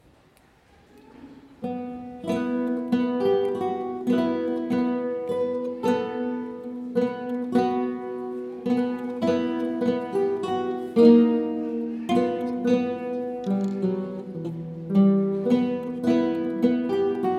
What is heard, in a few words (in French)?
Guitares Moyens